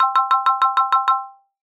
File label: arcade game fruit machine payout collect